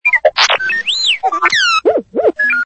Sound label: R2D2 sample message